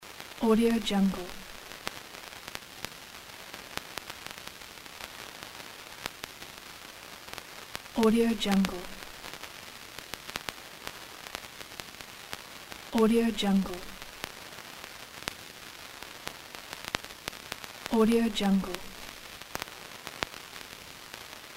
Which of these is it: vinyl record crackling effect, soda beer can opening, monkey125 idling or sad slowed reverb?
vinyl record crackling effect